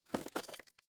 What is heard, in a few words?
impact crash